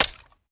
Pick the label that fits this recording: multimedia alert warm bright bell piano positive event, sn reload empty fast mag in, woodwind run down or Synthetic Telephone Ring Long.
sn reload empty fast mag in